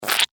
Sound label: Food Ketchup Squish Squeeze; Household